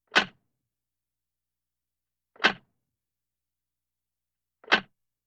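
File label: taxi meter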